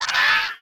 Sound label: harpie wind up; enemies